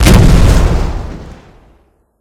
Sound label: shell; eviscerator